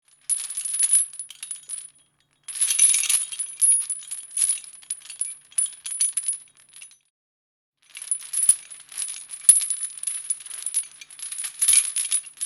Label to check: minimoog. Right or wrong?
Wrong